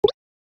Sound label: knock head